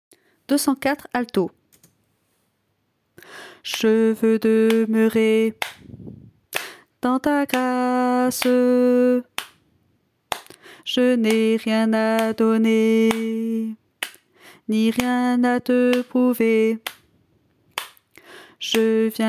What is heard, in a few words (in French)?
alto